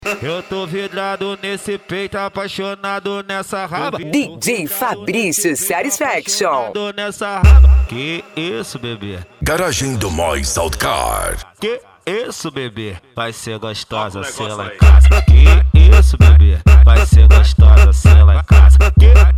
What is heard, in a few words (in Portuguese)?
funk mega bass